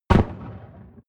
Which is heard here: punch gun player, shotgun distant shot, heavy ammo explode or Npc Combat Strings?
shotgun distant shot